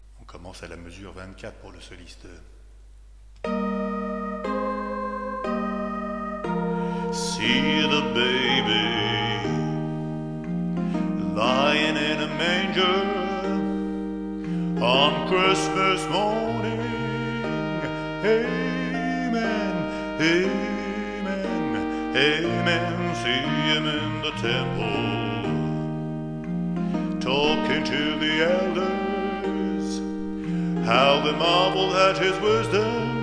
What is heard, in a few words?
Amen solo